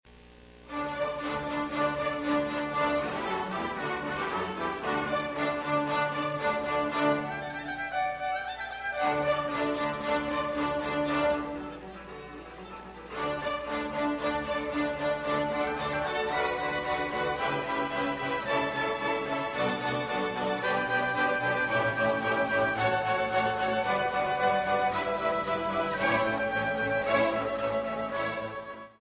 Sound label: orchestra